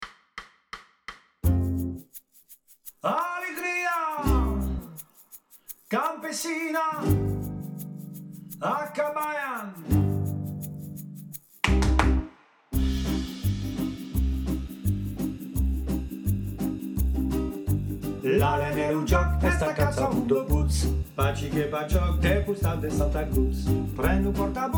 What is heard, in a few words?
Basses Tenors, chorale